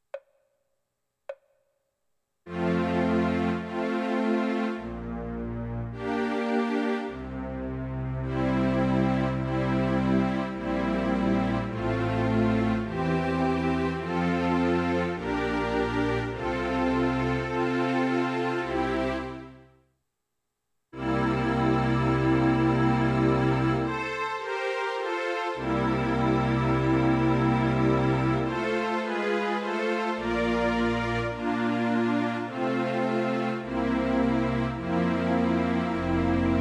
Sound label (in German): romanze f-dur orchester; beethoven